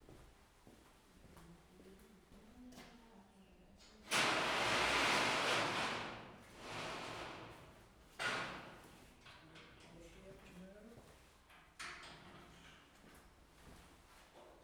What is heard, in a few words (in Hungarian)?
ketrecajtok nyitasa tigrisek beengedese, sziberiai tigris; állathangok; miskolci zoo 2018